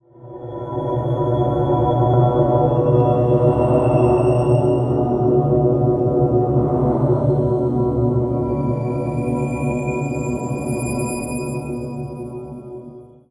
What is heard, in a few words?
mystical short atmo; Ambience